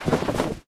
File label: Idle Cloth